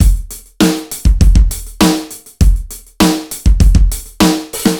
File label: Modern A, funk